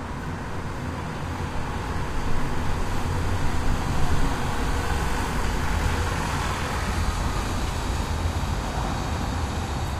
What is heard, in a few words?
city